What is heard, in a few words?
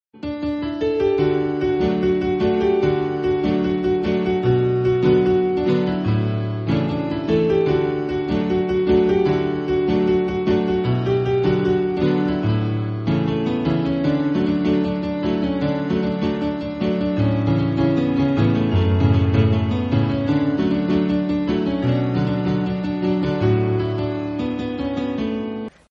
Instrumental, ringtone